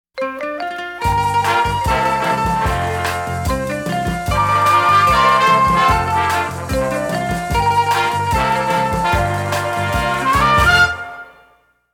Happy And Swing; Pams

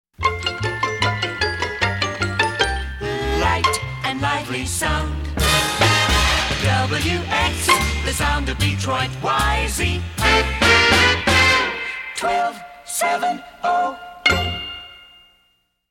Lite Lively Sound; Pams

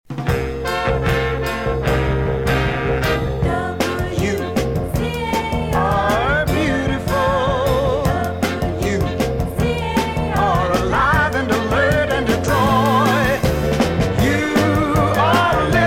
Jingle; WCAR AM; The Car That Drives Detroit; Chuck BLORE

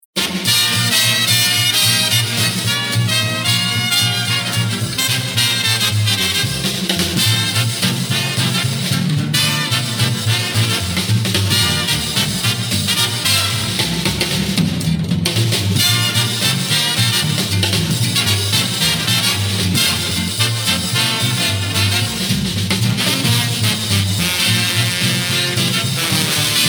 Orchestra

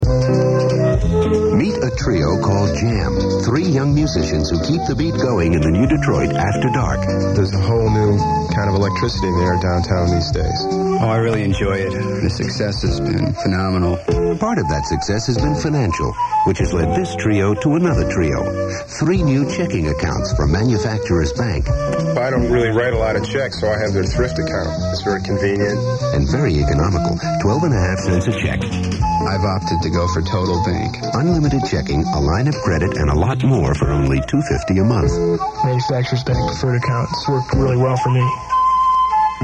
Jam; Trio; Detroit After Dark; Crowleys

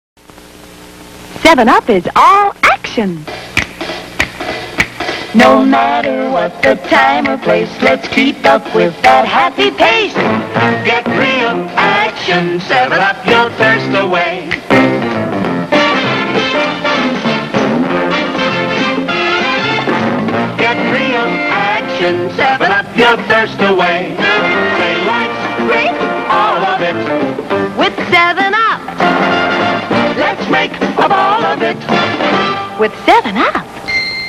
commercial Jingle; 7UP; 1960s

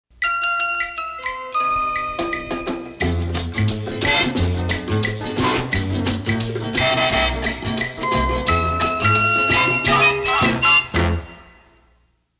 Ding Cha Cha; Pams

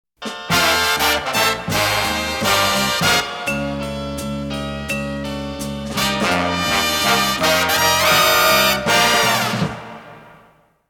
Horns; Pams

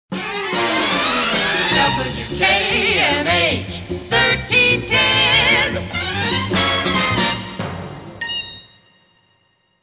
Radio Jingles; WKMH

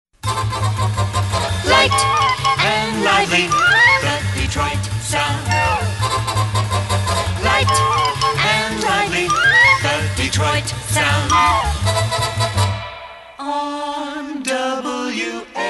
Light Lively Sound

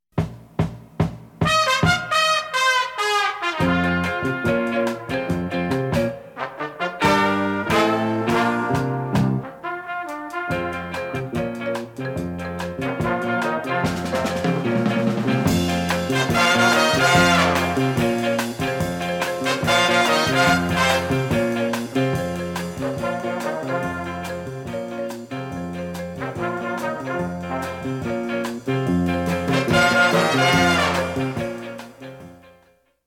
Pound And Drums; Pams

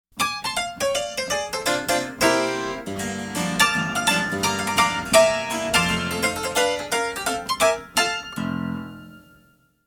Grand Piano And W X Y Z; Pams

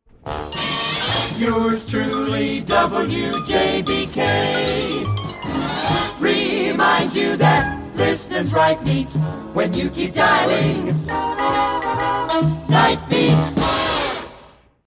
Jingle; WJBK-AM; Yours Truly Nightbeat

